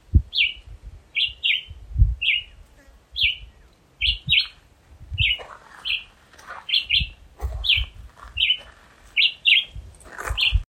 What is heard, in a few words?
black whiskered vireo